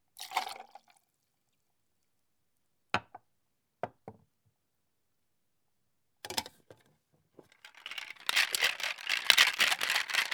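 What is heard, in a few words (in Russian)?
Шейкер делают коктейль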